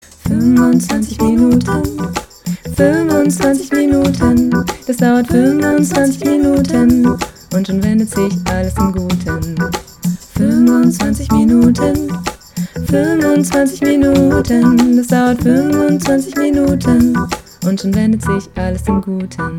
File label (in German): Reggae